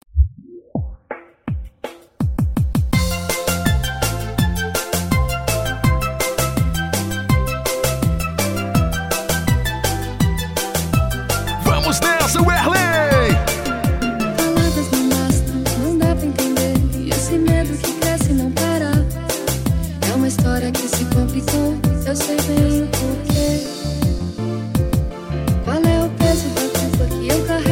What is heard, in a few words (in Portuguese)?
tecno melody remix